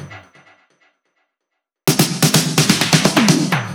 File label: Fills 128BPM, Clubsounds